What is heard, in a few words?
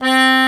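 bayan C4, Russian; EdgeSounds; Drum Mashines VOL-1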